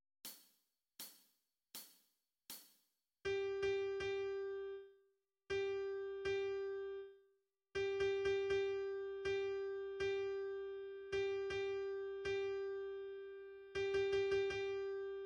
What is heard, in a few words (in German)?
rhythmus; Musik; Realschule